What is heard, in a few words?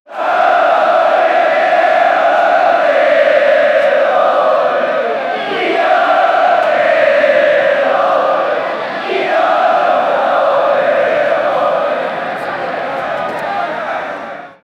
crowd singing during a fo, football